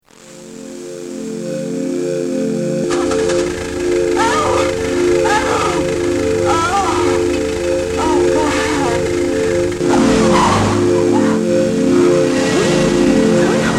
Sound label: Harsh Crash